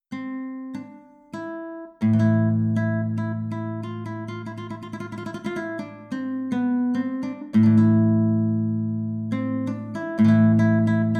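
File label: Guitar Spanish Improvisation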